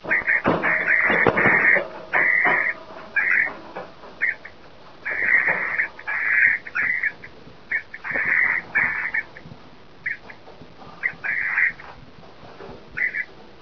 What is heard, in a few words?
parrot; de pirates